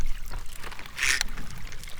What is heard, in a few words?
bark